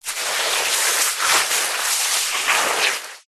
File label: wet sand hand movement; foley; zapsplat